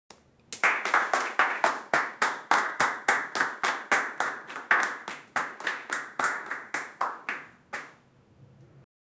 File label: clapping, applause; 4 clapping